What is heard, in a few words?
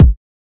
2000s warm bass drum single hit a key; kick drum samples